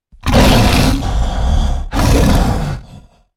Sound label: Pain Heavy; Evolve; Goliath